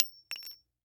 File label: bullet case 44 empty drop; a dump in the dark